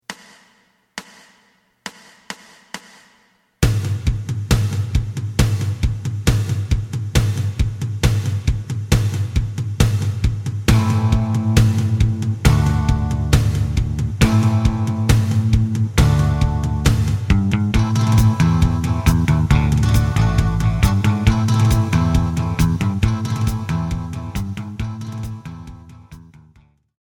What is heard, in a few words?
lead